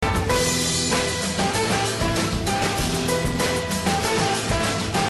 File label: Game Tune